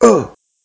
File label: grunt male